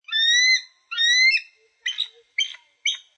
American Bald Eagle Squawks 2 Times Then Barks 3 Times, Bird of prey